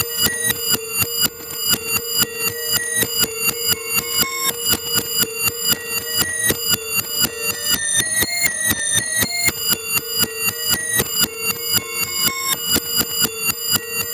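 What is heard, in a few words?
piano reverse; gareth piano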